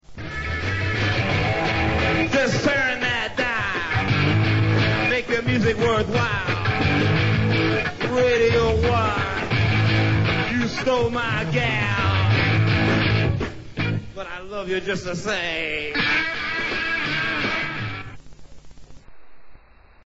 jingle; r1

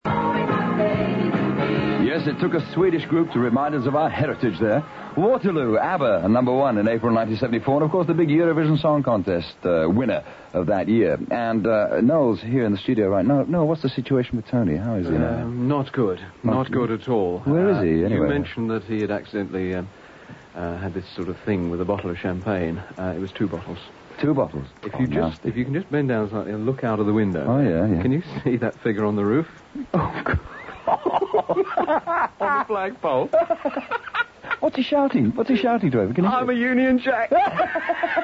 edm burn

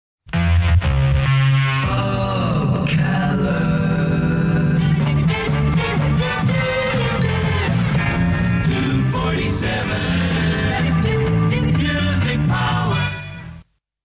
name jingle; power

